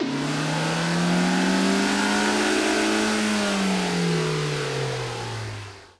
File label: near Win; hotline2